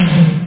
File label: tomtom